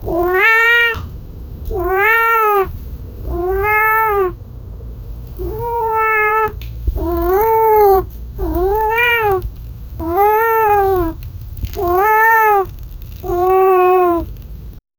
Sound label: meowing of a cat and; fictional creatures